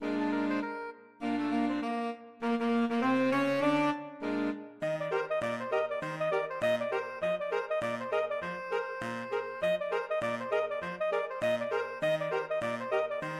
saxophone quartet

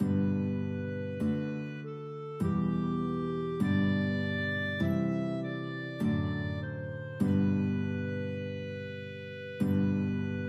abide with me clarinet guitar duet

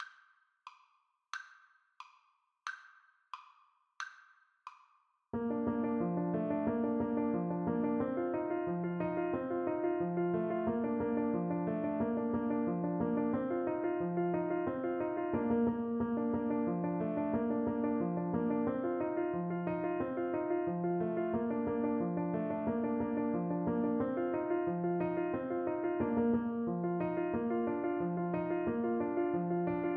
cincinatti hornpipe TPT

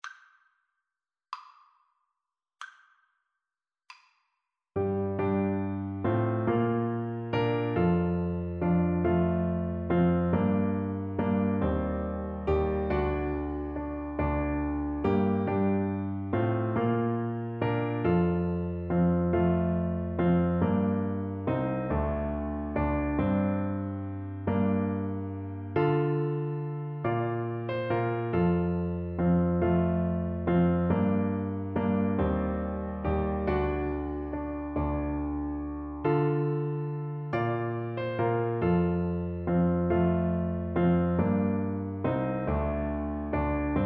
horn, French Horn

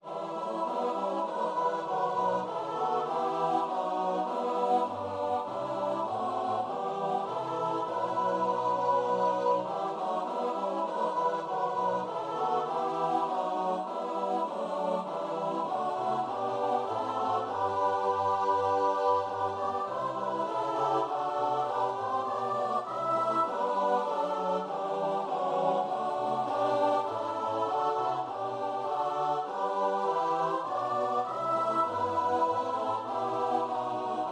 god rest 1st harm, choir

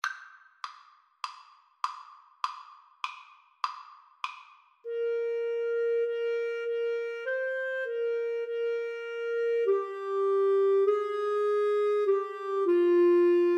Clarinet duet

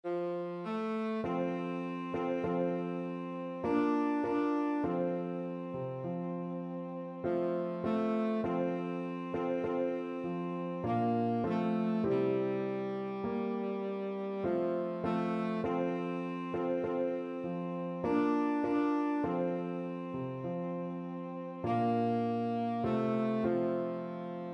tenor saxophone

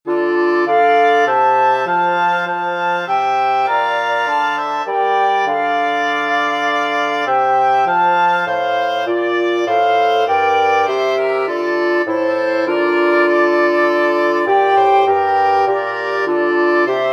wind quartet

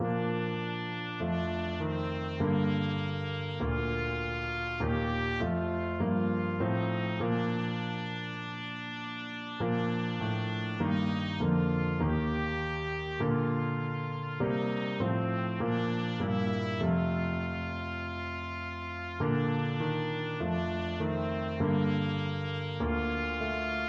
abide with me, trumpet

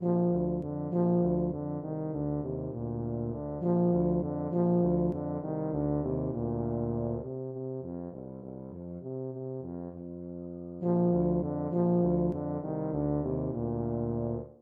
moaner TBA duet

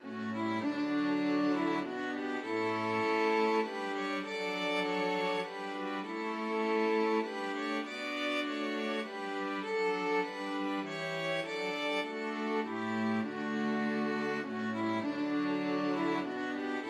string quartet; first noel

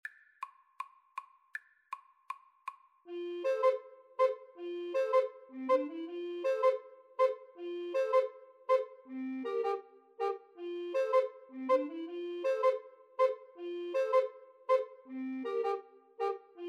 linstead market, Recorder Trio; Jamaican Trad.; SAB

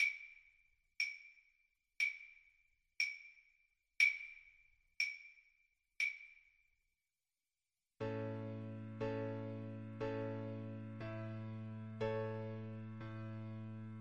spring, String Quartet

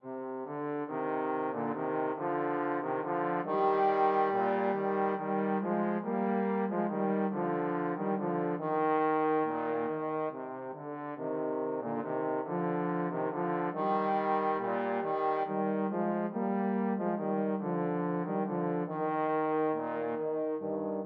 respighi siciliana trombone duet

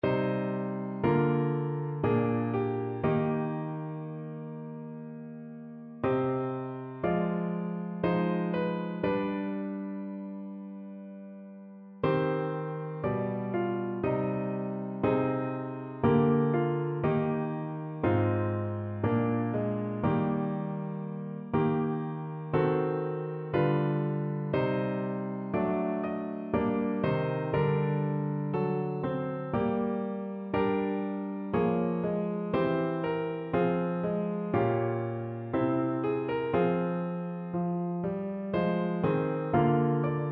bach piano; come sweet death

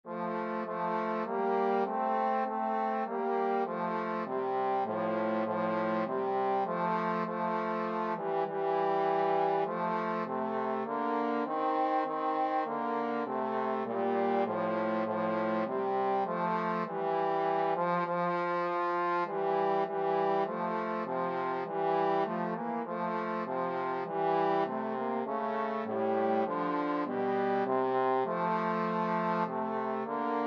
joyful joyful trombone duet

